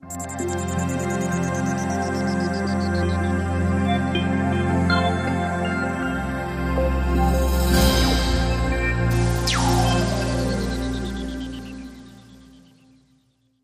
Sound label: Ramp Light